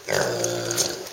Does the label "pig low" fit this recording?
Yes